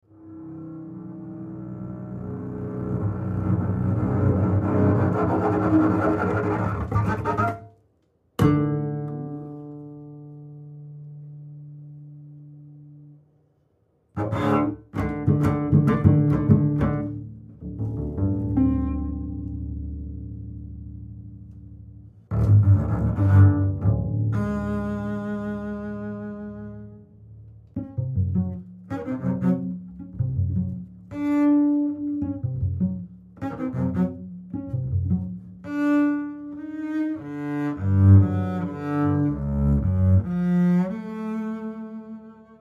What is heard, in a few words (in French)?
Contrebasse comtemporaine; louis-antoine lassonde